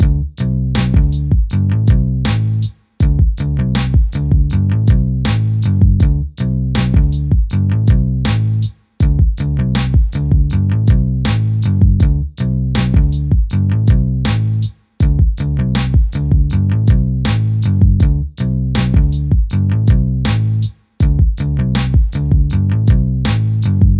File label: 80bpm Bassline Breakloop